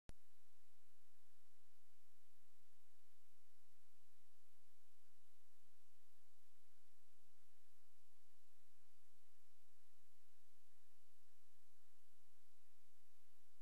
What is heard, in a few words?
noise; kbs